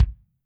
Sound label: KICK, Drums Percussion